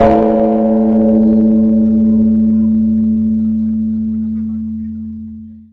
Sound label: Zen Buddhist Temple Bell